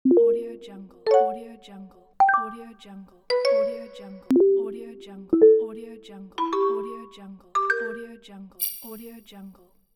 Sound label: text message